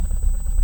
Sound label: Hydrophone